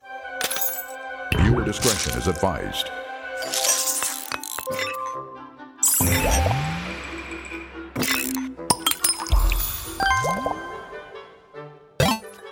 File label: Mobile Game Sound